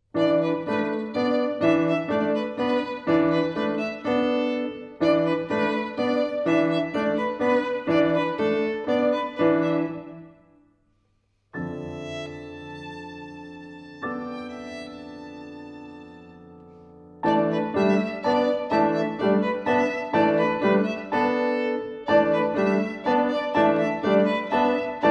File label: violin